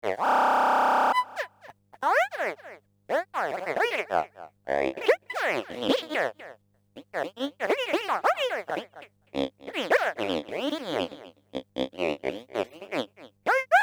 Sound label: Kaossilator; Korg